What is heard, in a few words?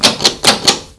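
door knob; doornob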